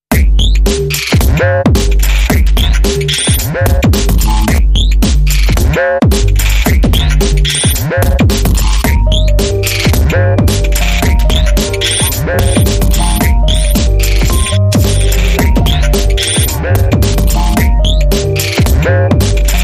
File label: Ambient